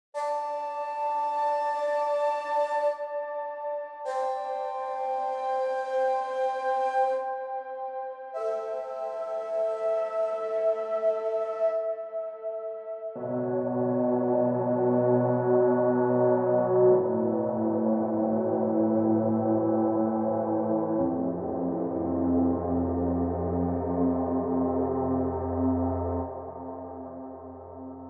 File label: ethnoscapes solos